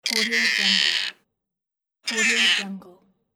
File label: door handle metallic creak